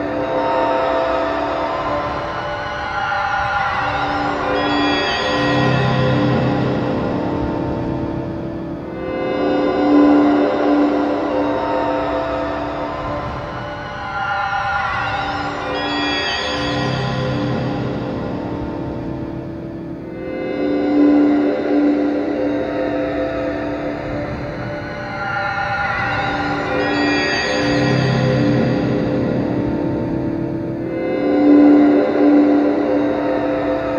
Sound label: Alien Guitar; Sonic Foundry (Sony Creative Software); Ma Ja Le Ethereal Textures